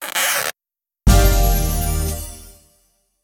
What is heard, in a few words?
tada